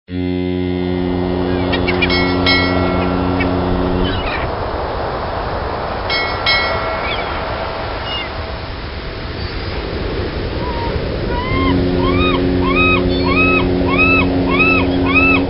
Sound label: Ocean Waves